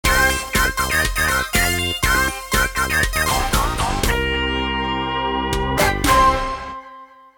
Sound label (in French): Emission link, jingle